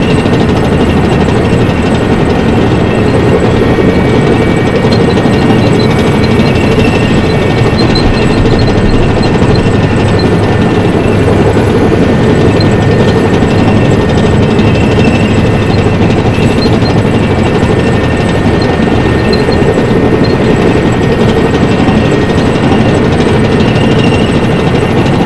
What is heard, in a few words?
abrams move v8 loop, vehicles; Call of Duty Modern Warfare